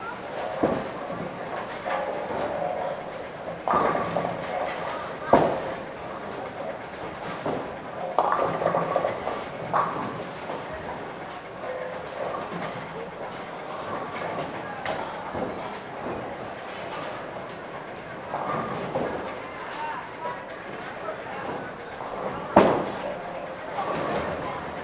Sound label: Bowling Ambience; Crash Tag Team Racing